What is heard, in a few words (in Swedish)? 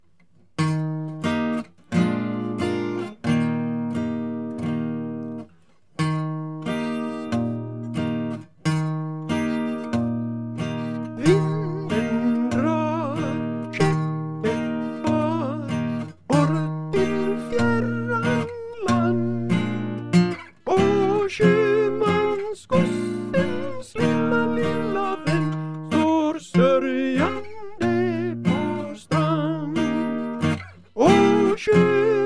vinden sopran